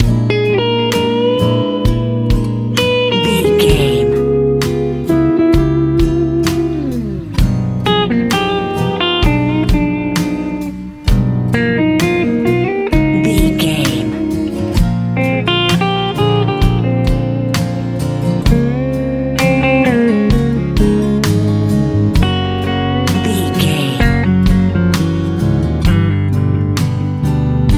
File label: warm colorful easy listening country